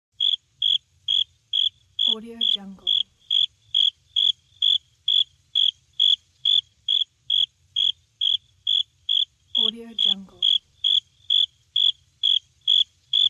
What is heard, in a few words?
cricket